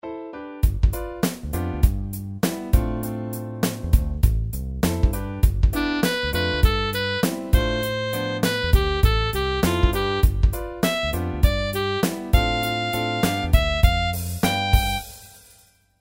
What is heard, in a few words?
funk